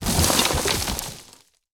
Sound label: debris, grenades; Frag